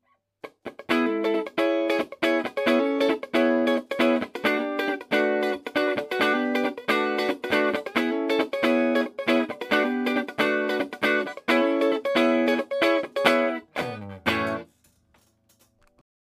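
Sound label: st clean; reverb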